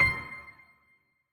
piano; osu

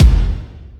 Intro Kick

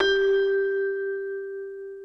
Bell G4

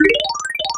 arp

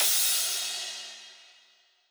crash

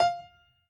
piano hi

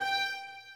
High Violin

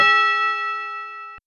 Bell G#5

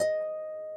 harp

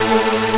synth; osu

